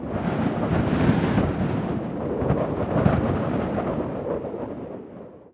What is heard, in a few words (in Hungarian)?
szel; Pure Pinball